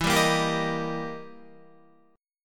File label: E Minor Major 7th Flat 5th, E, down, Guitar